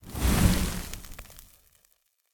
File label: death poof; smite; pele